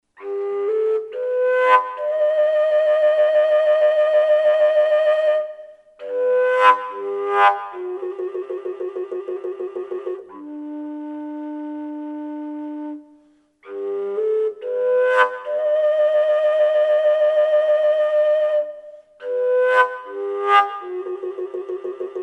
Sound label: fujara; fujara school; let me go shepherd; fujaridoo